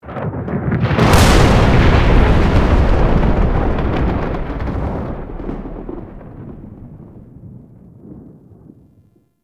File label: Sky Thunder Clap; Ambience; Warframe; Duviri; Murmur